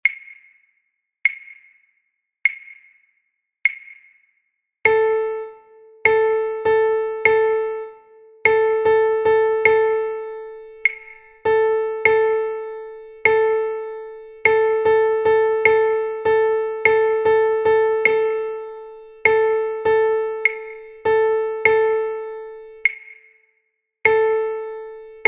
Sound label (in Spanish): dictado ritmico tresillo; Rhythmic dictation